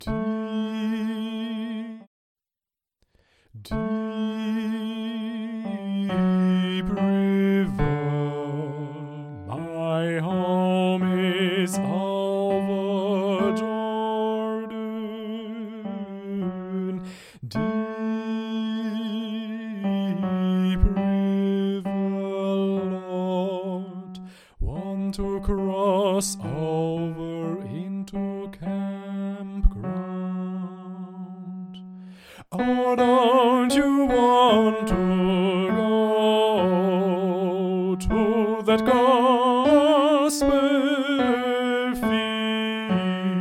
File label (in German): Tenor allein; Deep River